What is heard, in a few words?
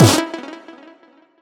combo power; quatuor